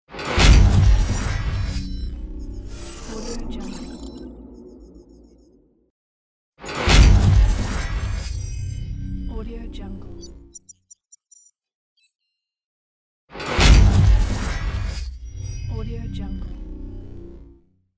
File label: transformation glitch